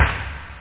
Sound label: reverb; Workbench Add-On - Volume 1; SNARE